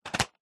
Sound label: household medicine tablet pack box set down; zapsplat